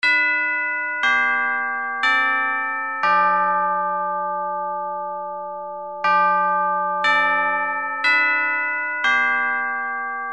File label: chime